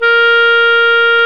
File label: Clarinets; Roland LCDP04; 90 s Sample CDs; Clar Cls